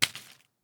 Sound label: Shell 39mm leaves; STALKER; IWP